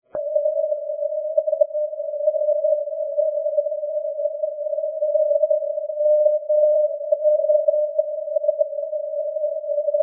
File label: ndb marseille provence; europe